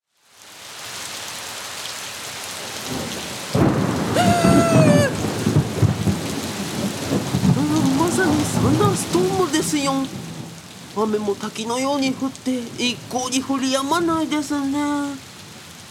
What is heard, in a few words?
Thunder